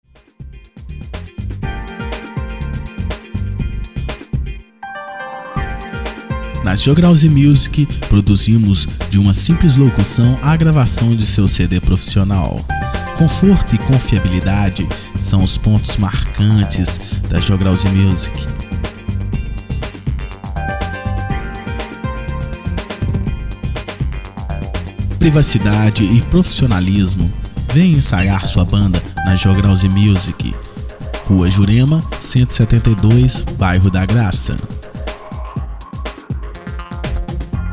jingles

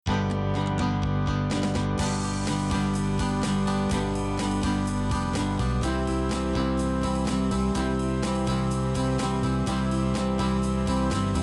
pop rock version instrumental

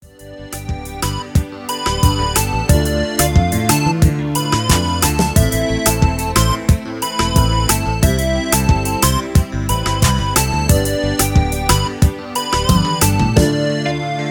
Kizomba

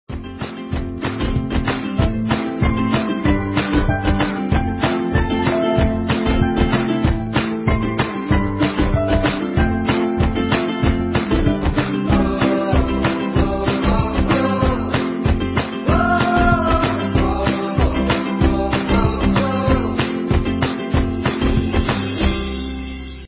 clap tap; Paródia; Comédia; Garanhuns

